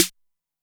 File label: snare, Zoom MRT-3B